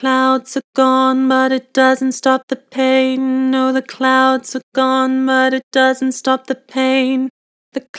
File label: Vibrato, Vocals; Clouds are gone; Harmor Vocals